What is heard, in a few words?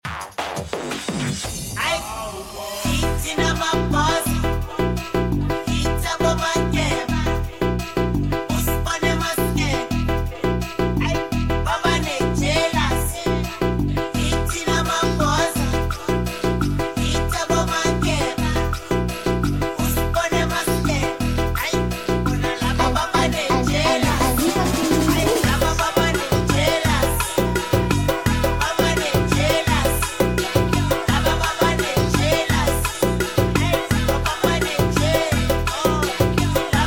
DJ FUNKOT REMIX; tiktok